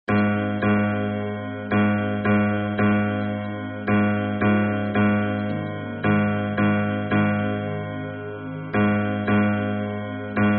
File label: hymn bass